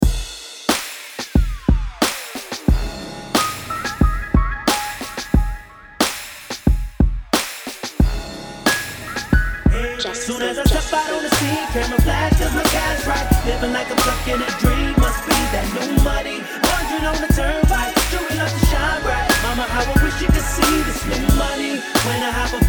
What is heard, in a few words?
hiphop